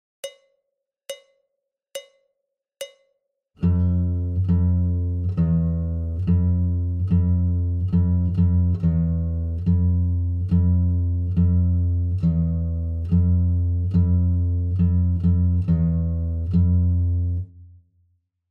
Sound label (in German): WarmUp F tief, gitarre